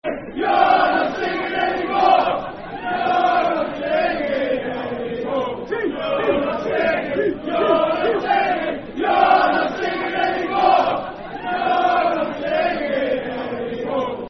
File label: Football Chant (Soccer Songs); You're Not Singing Anymore; Fulham Ffc